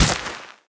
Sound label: carpet impact bullet; physics; surfaces